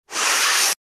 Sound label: Nose Blowing on a handkerchief